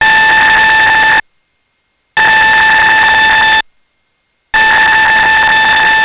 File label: EAS Alt Tone